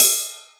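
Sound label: Boss DR-202 cymbal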